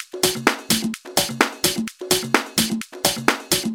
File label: Percussive Loops 128BPM; Vengeance Samples